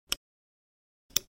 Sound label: Mouse drag and drop click; motion graphics sound effects